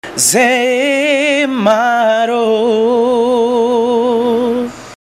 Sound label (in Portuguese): vinheta cantada; voice brasil